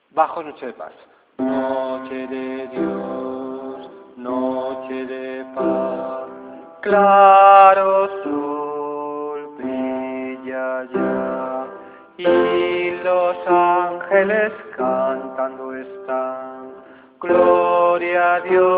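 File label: basses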